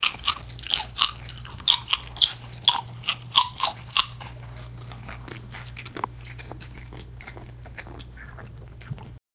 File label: dog eating food